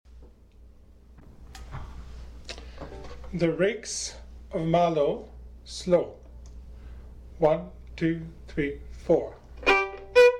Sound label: Rakes of Mallow (Slow), fiddle